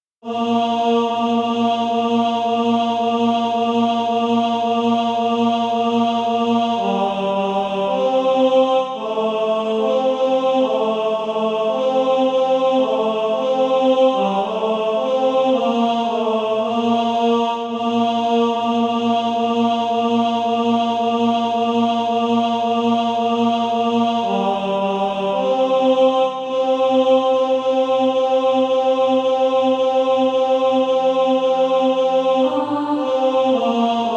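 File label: tenor, SATB Practise Music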